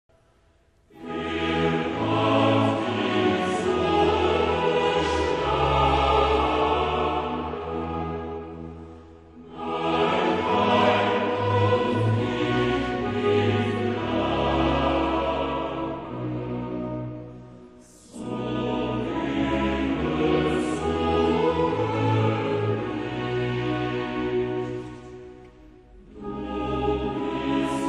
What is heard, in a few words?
koraal wer hat dich so geschlagen; bach